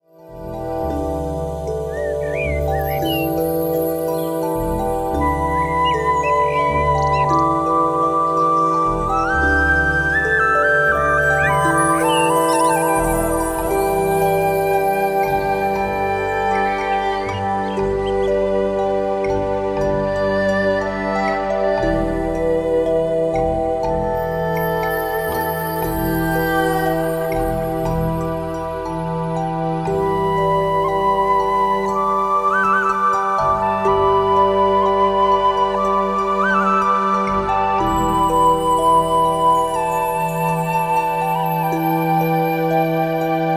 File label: Qi Gong